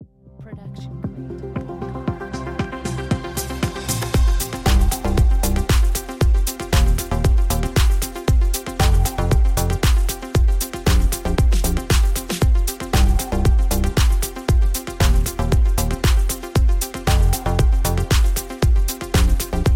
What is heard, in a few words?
Corporate Ident Electronic Beat; SoundsCrate